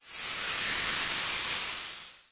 Monster

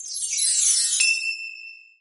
magic

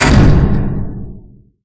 Door; yimengjiutian

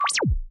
save; HumanBreak

